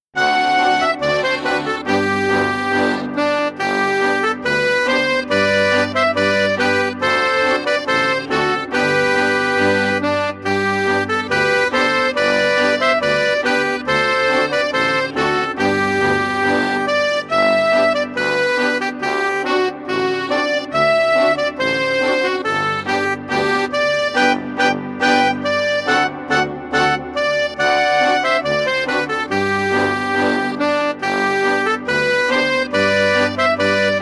kinderpolka

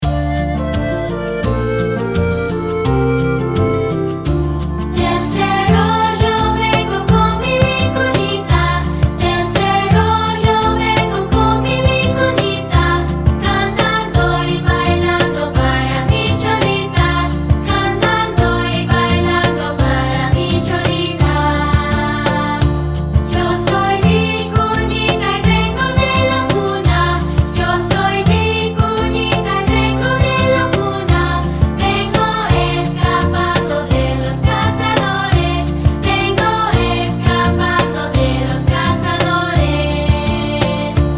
La Vicunita Vocals

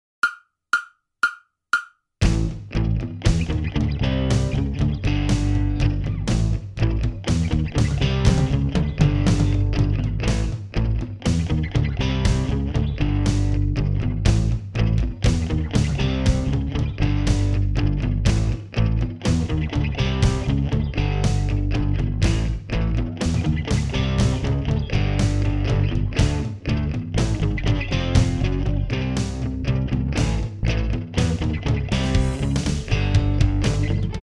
120 BPM 16 bar slow jam rock

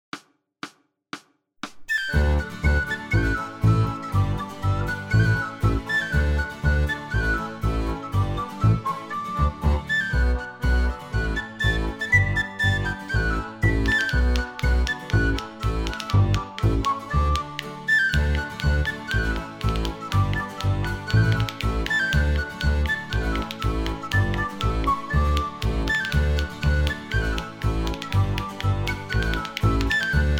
16 Bar Irish

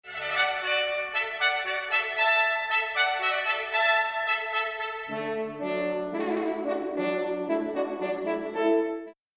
Brass Family; Instruments of the Orchestra

